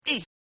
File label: male hit; phineas and ferb; snowbrawl